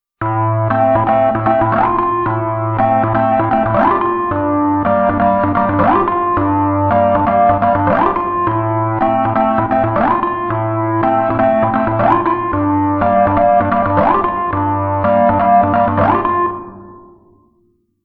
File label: Hammond